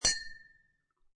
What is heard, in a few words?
Wine Glass Clink Two Wine Glasses Clink Together In A Toast Cheers; Champagne